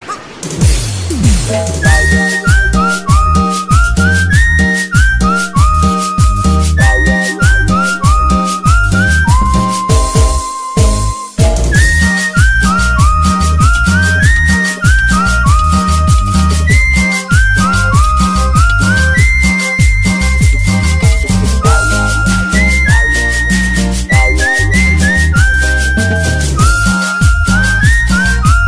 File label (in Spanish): Silbido; de Gohan